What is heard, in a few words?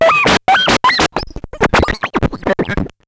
Bent Talking Teacher, Circuit Bent